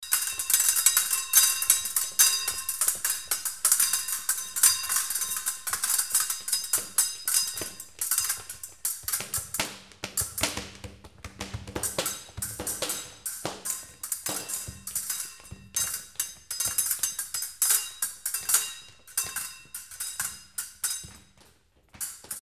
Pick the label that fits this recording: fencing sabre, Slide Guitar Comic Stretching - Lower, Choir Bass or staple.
fencing sabre